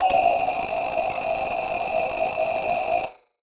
Casio, Glitched, malfunctioning synth

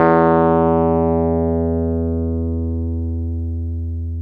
Wurly; Keyboards of The 60's and 70's

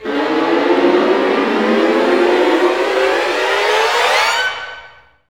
STRING GLISS; Roland - LA Composer vol. 1

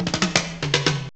ROLL, DRUMS; M8; CMI Factory

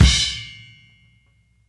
Drums Ending, 70s Analog Funk; Sonic Foundry (Sony Creative Software); What it Is! 70s Analog Funk

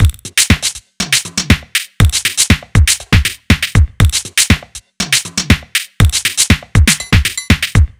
Walker Drum Beat; Sonic Foundry; The Electronic Music Manuscript Collection; Drum Loop Structures

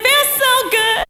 VOX; Zero G; Man Machine

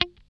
GUI F4, E-GUI MUTED; Sound & Vision; Gigapack I CD 2

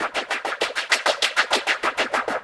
Echo Chuff Splat, Synthetic Pulses

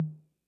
Tom Mid Max Decay, 808

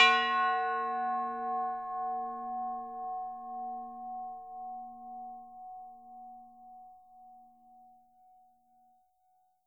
tubular bells hit F#4; AO bell

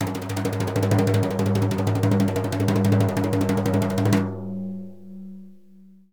Synclavier Percussion, World & Orchestral; Synclavier Percussion 2; ILIO

